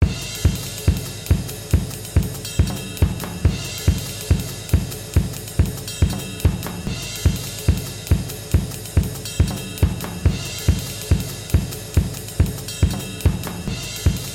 bgm summer battle

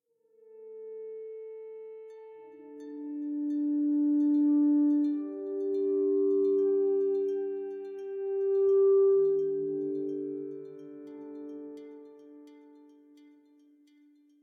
Tension Creepy

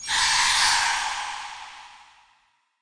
Btl Hitlrng Light; The Denpa Men; Android

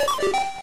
elevator beep; Pokemon Reborn E19

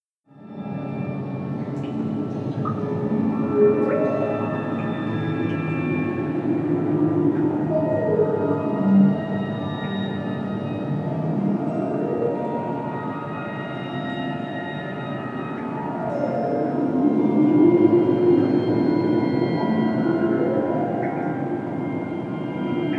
Cave Ambience; Monster Hunter Freedom 2 complete soundtrack

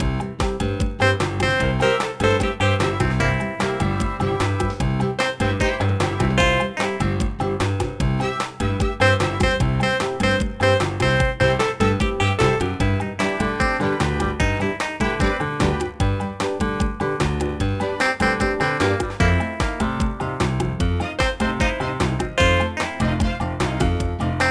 Seals Music; benjamin bluemchen ein tag im zoo; nds gamerip

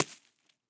metal chain drop dirt thud

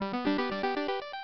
Throw Item; Pac-Man Kart Rally; java

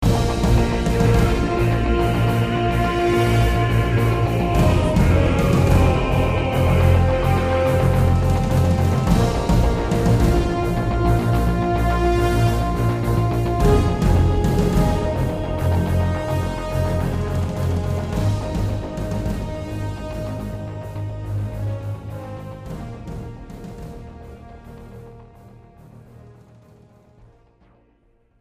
Building Recon Success; Counter-Strike Condition Zero

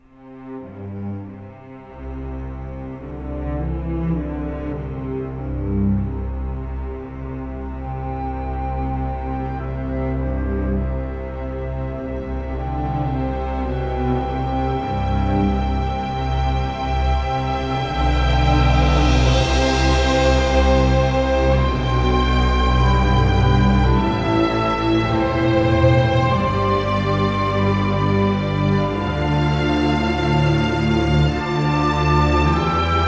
INTRO MUSIC; brothers in arms earned in blood

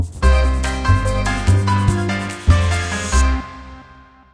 Win

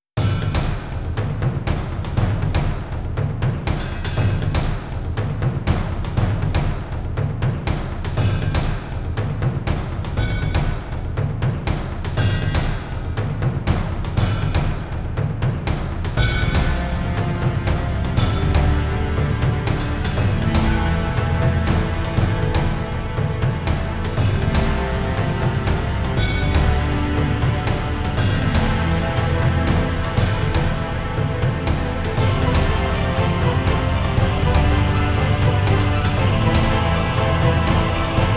Drum Battle; Grand Battle

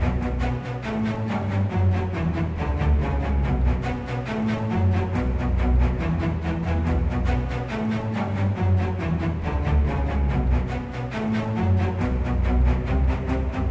bass; Sky Diving

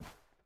Step Carpet; MiSide Demo

